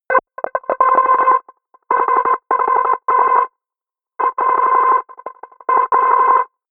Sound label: atmosphere old scanner